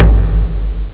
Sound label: D-20 TOM; Amiga Soundtracker Sample Packs